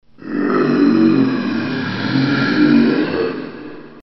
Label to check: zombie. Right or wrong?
Right